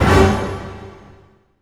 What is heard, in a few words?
hit G; 128 PREDATOR